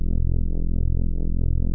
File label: guillotine idle, weapons